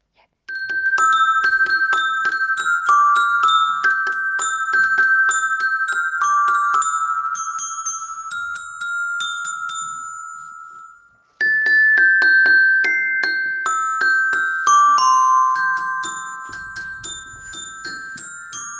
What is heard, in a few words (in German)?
Weihnachtslied mit Glockenspielen